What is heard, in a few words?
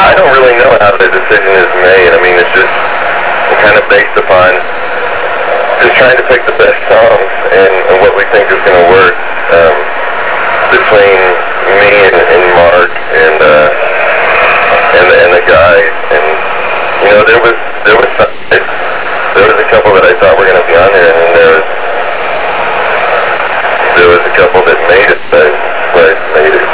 picking